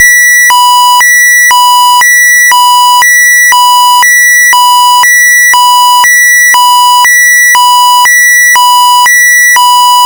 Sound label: Alternating Singapore, combined signal; Alternating tone; pfannenberg; signaling-technology; combined-signaling